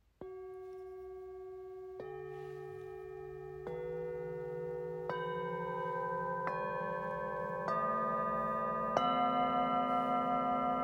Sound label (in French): Set bengale; Inspiration